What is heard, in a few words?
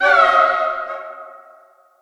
Musician Flute Player Hit